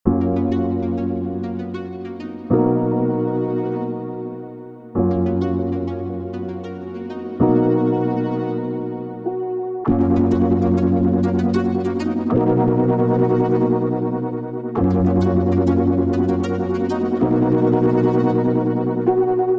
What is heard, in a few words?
keys; phat vst by aldenbraverman